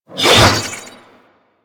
Sword Whoosh; DE; Warframe; Weapons; Sentient; Stalker Sentient Sword

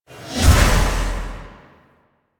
Temporal Drag Cast; Warframe; Focus; Zenurik

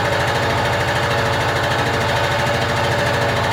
Machine Loop; ambience; props; loops; Warframe; Venus Open World; Venus Solaris United

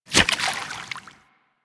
Step Water, Footsteps; Warframe; Kubrow

